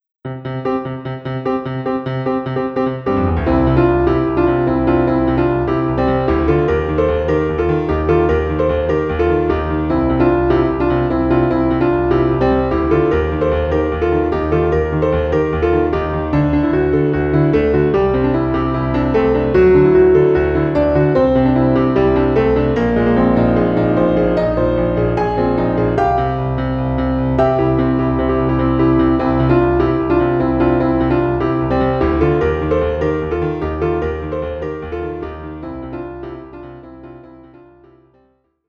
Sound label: piano; Kirbys Block Ball